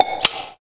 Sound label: Shutter; LG; L601i-SIMPURE-L1